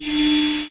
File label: Weapon Plasma